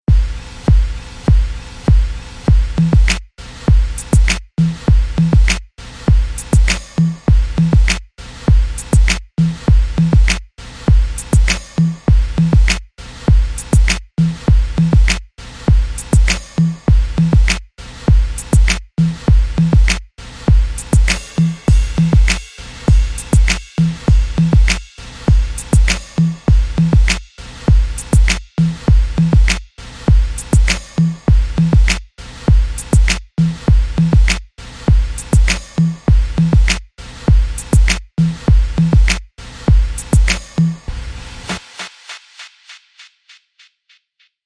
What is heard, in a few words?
Sustain Beat